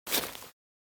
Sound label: Footsteps, Human, Grass, Run; backrooms 2d android ios online windows gamerip